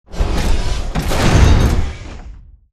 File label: melee backhand; Titanfall 2